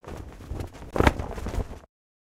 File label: Flag Flap; ninjago